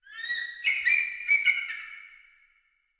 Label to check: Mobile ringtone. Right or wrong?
Wrong